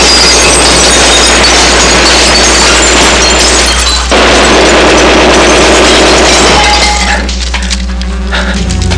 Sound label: uzi